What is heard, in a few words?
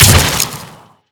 frost bolt; skyrim